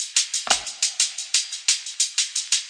178 bpm shakery frog croak brazil, drum loops and breaks